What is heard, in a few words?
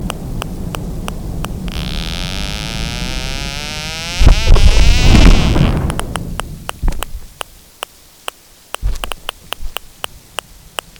beaked foraging